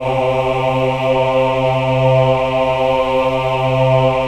VIB C3, VIBRATO, Voices; Spectrasonics

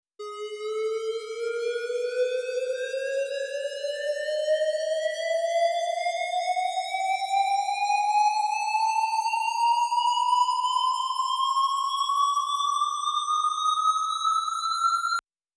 128BPM Uplifter Hollow Key G#; Vengeance - Essential Clubsounds Vol.5; Break the Chains; G#min

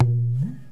SARANGI TAB

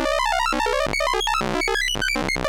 ARP2600, modular syn

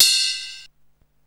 Ride, Alesis Hr16, Drums

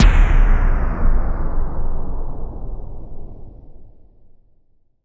Percussive Stab

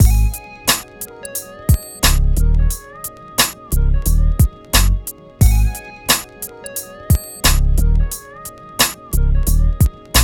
Hip Hop and R&B Drumloops, mix; Best Service ProSamples vol.01; AKAI

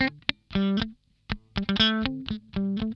DAMPED, Funk Guitar; 90 s Sample CDs; Zero G

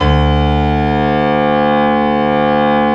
piano D1; Analogon

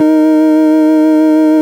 FARF, FARFISA

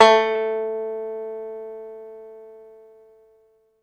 banjo, BANJO+FIDDLE; Best Service ProSamples vol.55 - Retro Sampler; AKAI

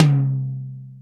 DRY 12 TOM; Greytsounds; Scott Peers Sound Engineering

